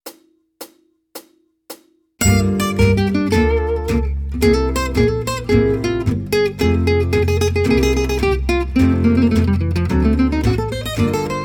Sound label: Jazz, Guitar